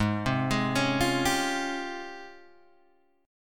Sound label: G sharp Major 13th, Guitar, Standard; G#M13; arpeggiated; 4,3,6,6,6,3; down; capo=3